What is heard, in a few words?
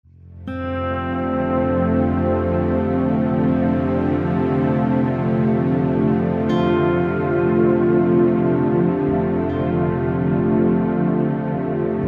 Tai Chi